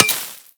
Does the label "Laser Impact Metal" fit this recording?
Yes